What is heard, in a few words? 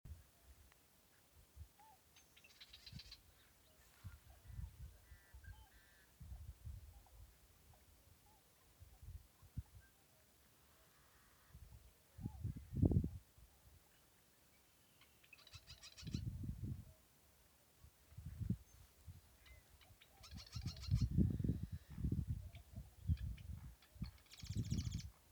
bear river birds